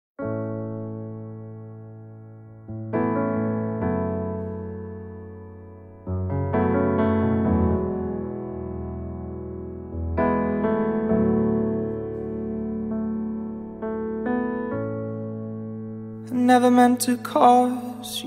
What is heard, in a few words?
Acoustic